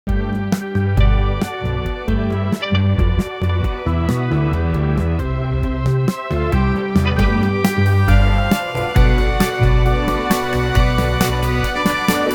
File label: romantic dance songs